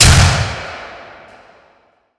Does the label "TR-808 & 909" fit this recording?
No